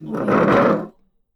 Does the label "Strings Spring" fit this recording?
No